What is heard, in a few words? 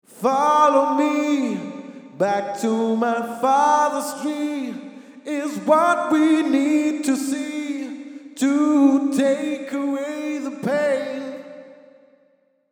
Vocals male